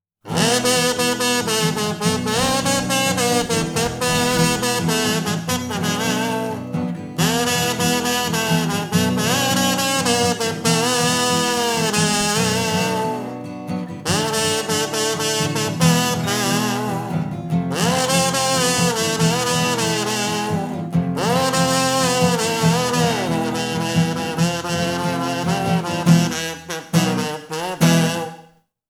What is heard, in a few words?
kazoo